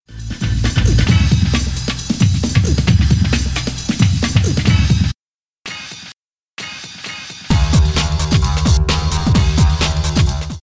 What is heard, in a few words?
Stab